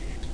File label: tally bonus; Frog Xing 1.1